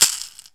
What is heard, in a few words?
ROCK PERC.